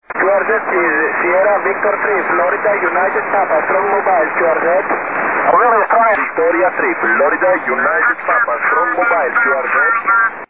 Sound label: 2m Es; SV3FUP